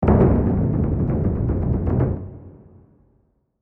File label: Gran cassa rolls